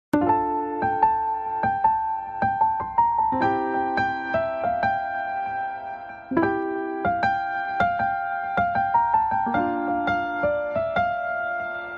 love bgm ringtone

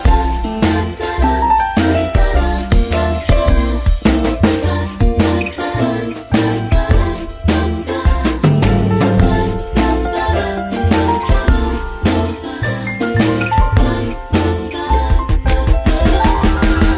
Instrumental Tone

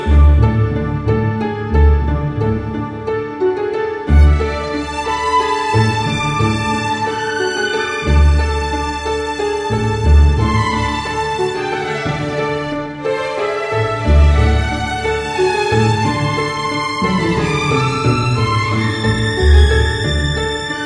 Instrumental; Uttama Villain

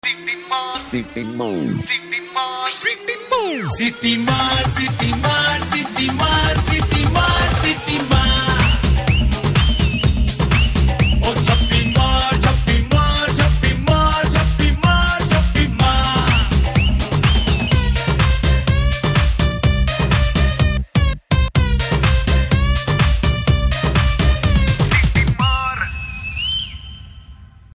bgm drum beats

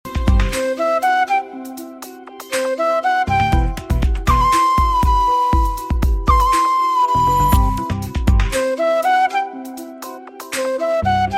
Instrumental

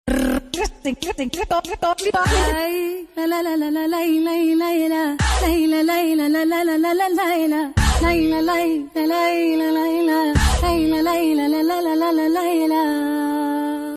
BGM Humming

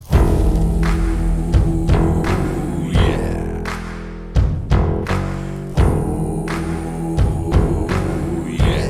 Telugu Trailer BGM; Jagame Thandhiram

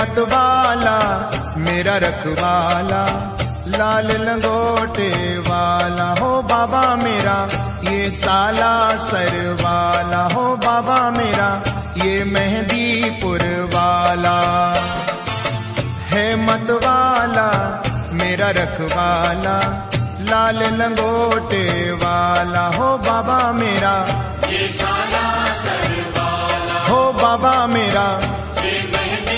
Hanuman Ji Bhajan Ringtone

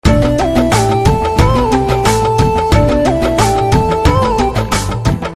BGM Ringtone

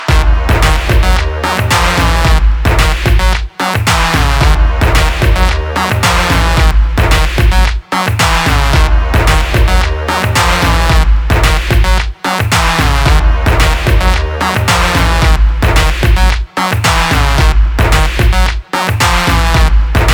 Drum Beats

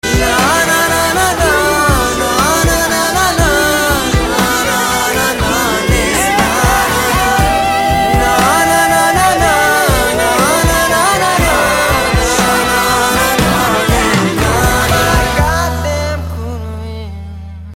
BG Humming

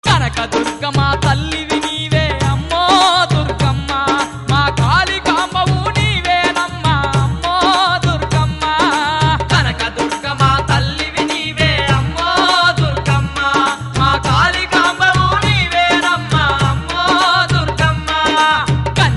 Telugu Ringtone

